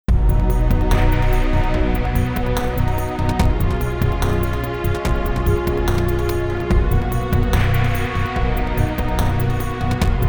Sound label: victory theme; Dungeon Defenders II